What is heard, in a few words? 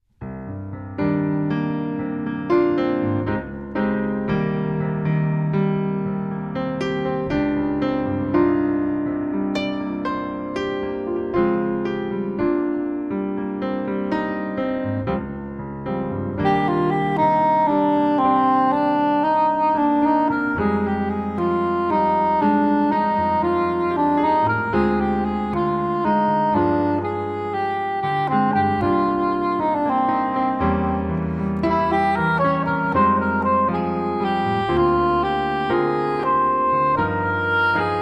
Spanish Dance